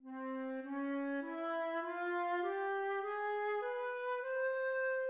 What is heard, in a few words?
Double harmonic scale